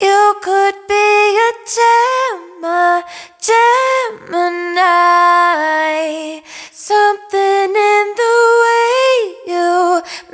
hook Gemini 140 Ab wet, Vocal; Slate Digital Omnivox Vocal Sample Pack

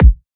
kick deep, Drum One Shots; SD MIDNIGHT Sample Pack; Slate

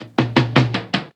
tom past; Drums; One Shots; Slate; AURORA Sample Pack; Toms

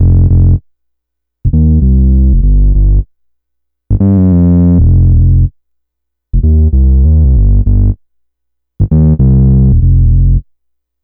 Synth loop 98 Fm, Synth Bass Loops; Slate Digital BASSHOLE Sample Pack

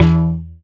tonal oneshot E; Pop; Slate; PASADENA Pop Sample Pack; Tonal One Shots

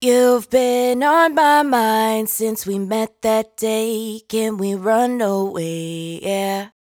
hook 125 Em, Dry Vocals; Raw Vocals; Run Away; Slate Digital Omnivox Vocal Sample Pack

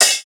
hat brief, Open Hat, Drum One Shots; SD MIDNIGHT Sample Pack; Slate

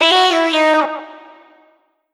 Vocal Sample Pack Phrases B; Slate Digital Omnivox Vocal Sample Pack

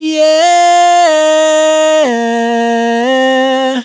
riff Yeah 125 Fm, Dry Vocals; Raw Vocals; Slate Digital Omnivox Vocal Sample Pack

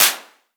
Clap, 909; Slate; Vintage Drum Machines Volume 3 909 Edition

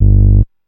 Synth oneshot Short G, Synth Bass One Shots; Slate Digital BASSHOLE Sample Pack

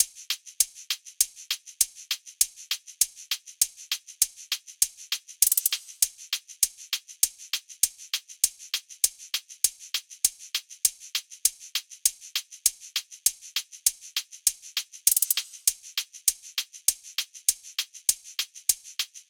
hats 100, Hat Loops; drum sample pack; Kavachi; SEISMIC; Slate

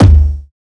tom, Drum One Shots; Slate; SD MIDNIGHT Sample Pack; Toms; toastedcircuit

